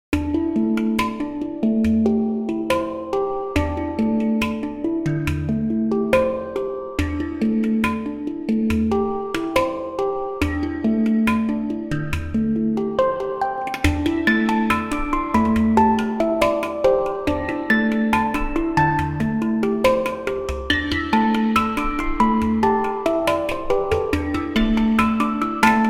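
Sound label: hand pan narrow circle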